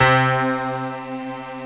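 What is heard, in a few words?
synth C, STAB, House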